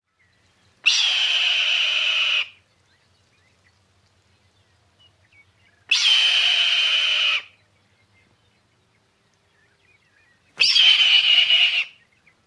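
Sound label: RETAHA typical calls num 2, red-tailed hawk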